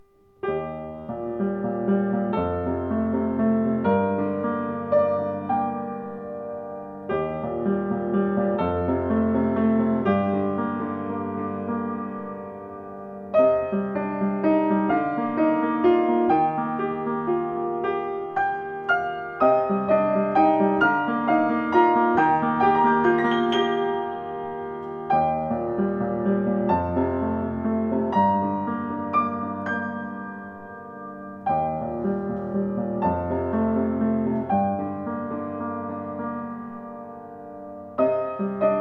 Steinway Z Klang; 115 steinway z nussb

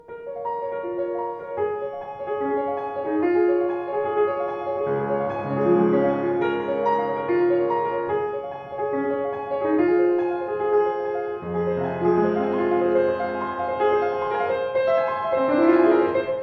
Schimmel, klavier; 112 schimmel nussbaum

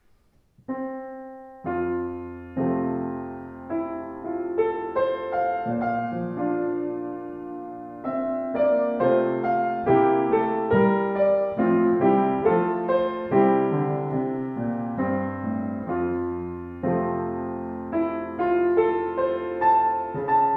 Sauter Pure Basic Traum, Klavier

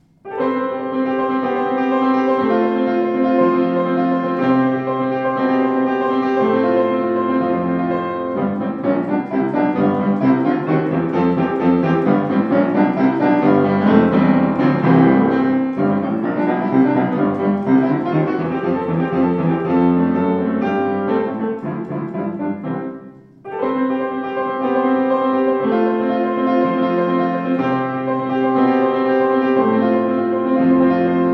Bechstein Rag, klavier; 124 bechstein elegance silent

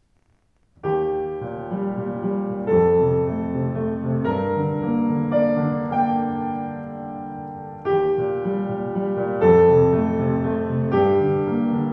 Ibach Klang, Klavier; ibach 115

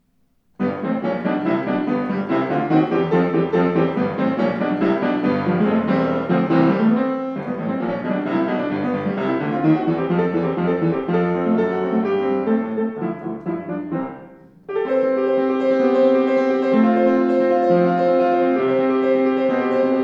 Schimmel Rag, Klavier; 118 schimmel nussbaum

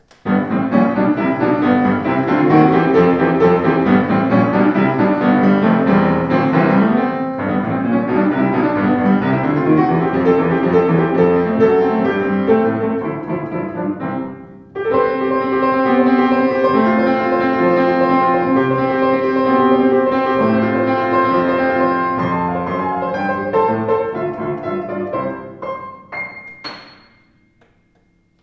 Sauter Esche, Klavier; 114 sauter esche

